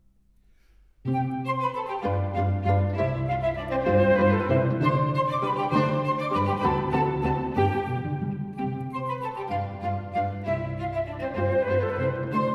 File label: flute quartet in C; Variation VI; Mozart; K.285b